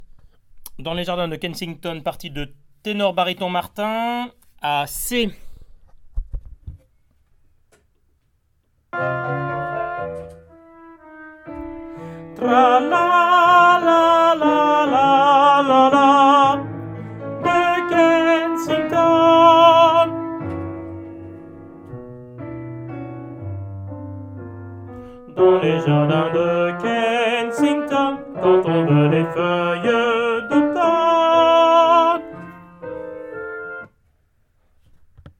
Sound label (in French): Dans les Jardins Tenor